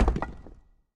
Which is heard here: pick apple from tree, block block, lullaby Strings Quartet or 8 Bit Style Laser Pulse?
block block